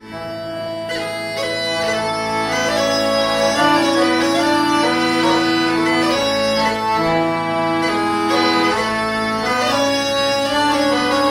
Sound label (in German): Marsch, sackpfeifen; Garnbindarn